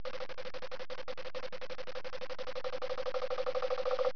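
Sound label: temple blocks; 10,000 Graphics Pack Volume 1; Media Graphics International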